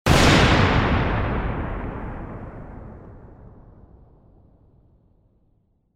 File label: Weather Storm Lightning Bolt Crash Crack